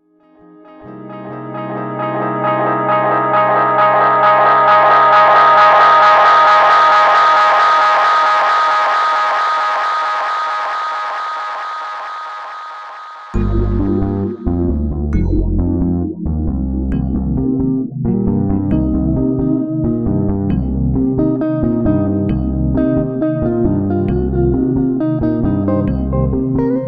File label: MIDI blues